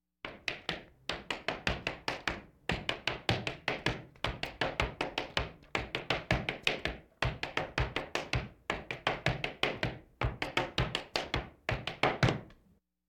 Laugh tracks

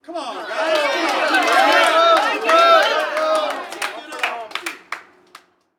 Street Ball Crowd Encourage; Crowds

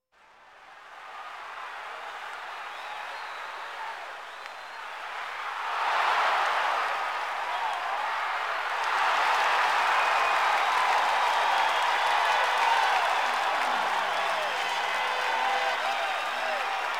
Sports crowds

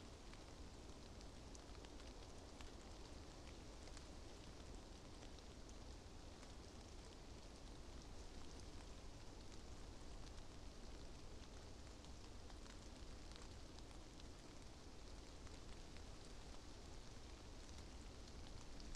Rain quiet light tone; boom library; Thunder And Rain

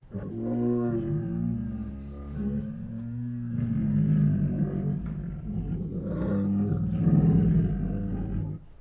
Two Tigers Make Deep Throaty Growls; Sound Ideas

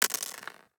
DICE on Plastic, Throw and Roll, Mini, 6 Six Dice; Samples; ArticulatedSounds; DICE Sfx Party (Extended)

